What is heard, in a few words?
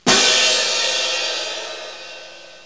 cymbal